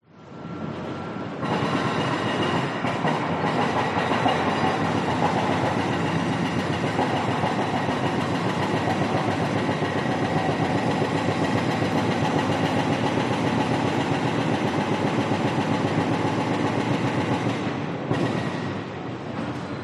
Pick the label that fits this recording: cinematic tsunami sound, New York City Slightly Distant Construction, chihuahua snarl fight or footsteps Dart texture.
New York City Slightly Distant Construction